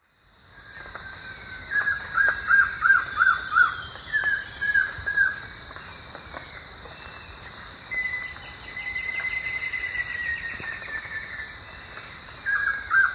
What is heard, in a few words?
Trogon collaris, duet; S. America; Eastern Lowlands